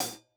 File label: hat hit, closed hat